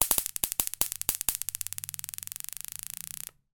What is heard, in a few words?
animals insects fly electricution fast zapper close up